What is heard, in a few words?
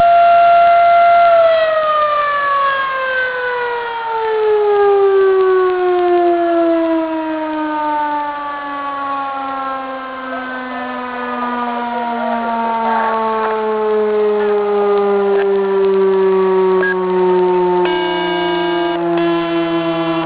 siren alert down tone